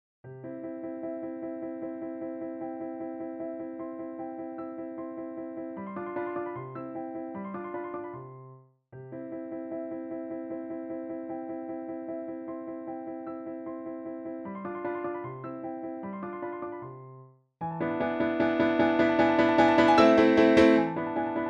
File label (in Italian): Esercizio Per Pianoforte; Ludwig van Beethoven